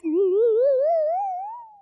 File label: love emote; animal crossing